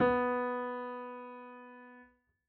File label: piano b; Golden Crayon Sounds